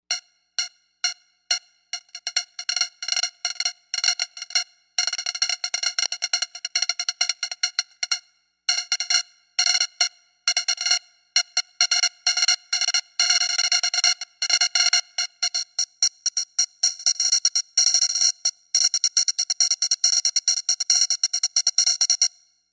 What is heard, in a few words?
Ana High Hat; Novation UltraNova; Factory Patches